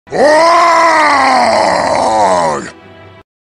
waaagh

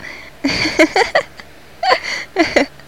cute giggle

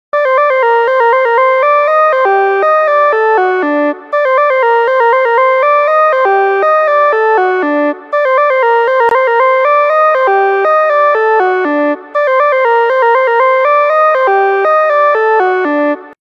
ringtone; sarvente

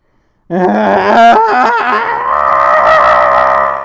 scream

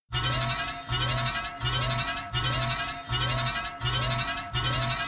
batwave alert sound fx

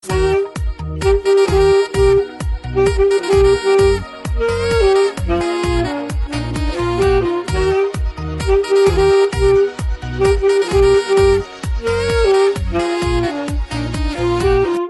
double sax

